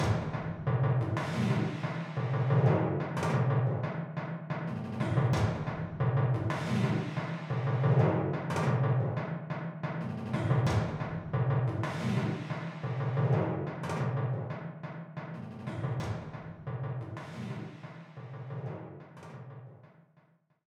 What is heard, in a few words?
BGM Mission Stage Leak; Alterna C07